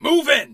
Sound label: charge; st allies; darkwing